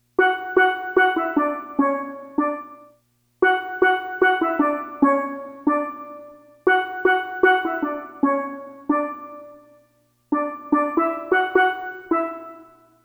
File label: steel drums